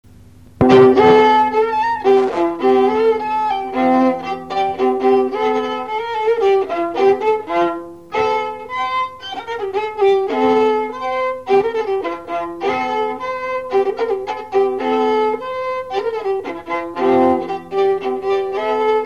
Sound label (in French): Polka piquee